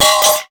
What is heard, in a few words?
SOUND EFFECT, Metal; AKAI S6000 CD-ROM - Volume 1